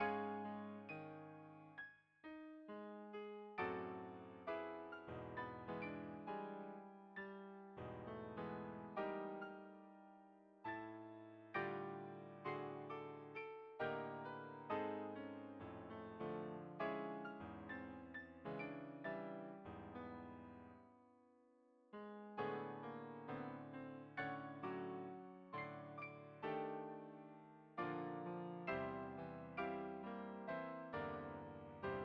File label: Death Waltz sweet